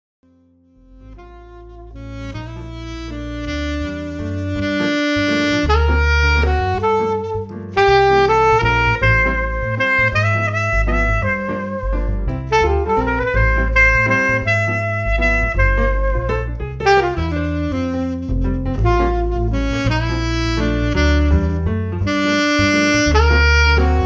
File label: keyboard sax jazz